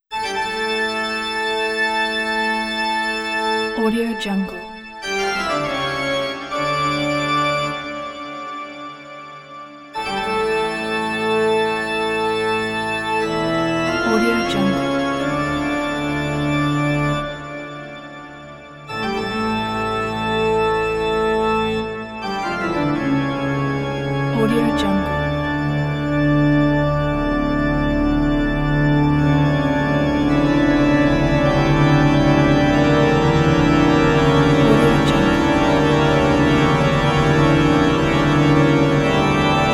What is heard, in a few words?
bach toccata horror halloween